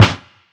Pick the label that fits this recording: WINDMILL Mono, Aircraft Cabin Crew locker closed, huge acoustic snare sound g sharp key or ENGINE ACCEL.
huge acoustic snare sound g sharp key